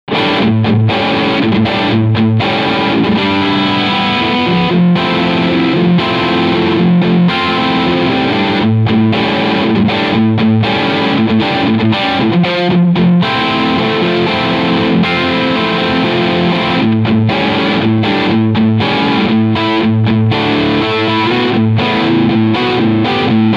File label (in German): Legacy Leadsound Steg Pickup; GL Tribute Black